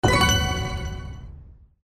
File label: voice eq up